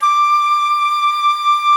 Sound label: FLT XFLT, Flutes; Club-50 - Foundations Roland